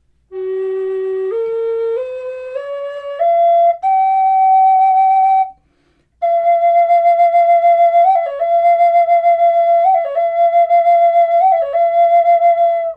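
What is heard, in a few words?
natural, wood flute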